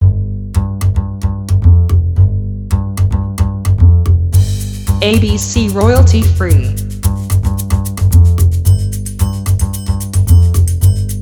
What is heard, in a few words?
Funk